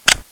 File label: Shutter; ZTE; R90